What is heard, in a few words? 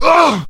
man hit; Mount&Blade Warband